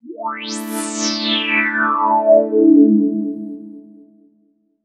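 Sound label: deep house FX, 125 bpm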